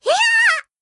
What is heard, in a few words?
kiyaa